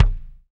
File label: BD, Roland; Sound & Vision - Gigapack I